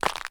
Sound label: Bone Fracture